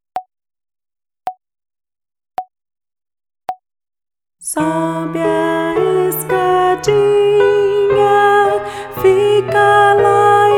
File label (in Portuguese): A Escadinha (Vocal); Violino para os Pequeninos